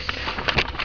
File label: PAGE BACK